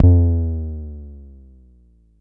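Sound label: Long bass drum, Jomox Airbase99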